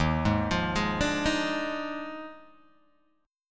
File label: Eb Major 7th Suspended 2nd Suspended 4th Eb Guitar Open G